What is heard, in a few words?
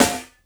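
snare drum, Drum & Bass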